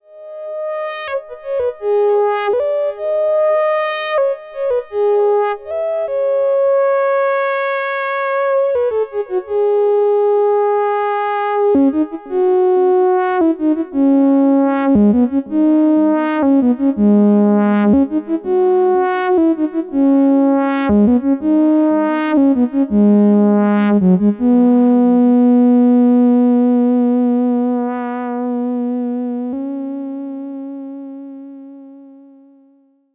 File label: sh5 soft solo